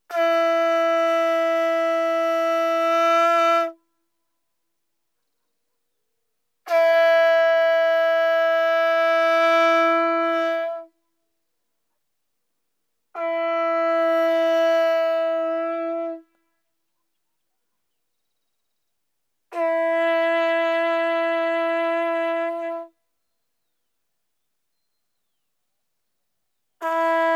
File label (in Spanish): PUTUTO TOQUES DIVERSOS; instrumento; viento